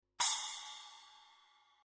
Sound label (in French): Son cymbale; mirumiru